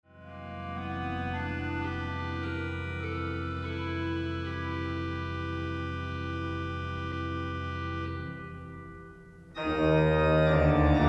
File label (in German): Orgel Drop Outs vorher